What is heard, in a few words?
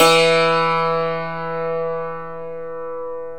HAMMRD DUL, Stereo Dulcimer